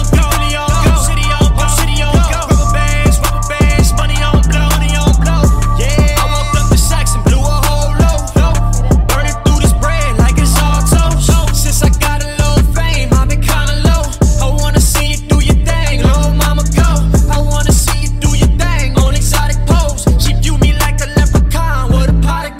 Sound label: ringtone english ringtones